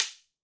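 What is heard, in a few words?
clack centre courtyard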